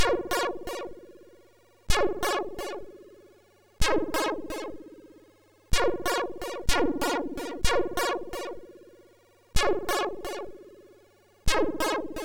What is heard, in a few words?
Noise, sid MOS6581R4